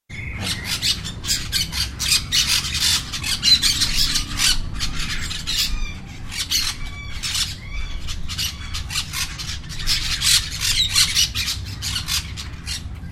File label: bird chorus